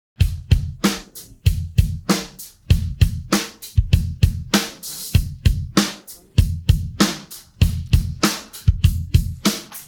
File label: drums pattern